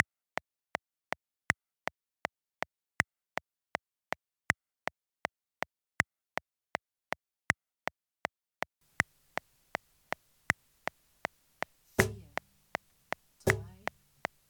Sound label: Play-Along 160 Cajon; Perkussionist innen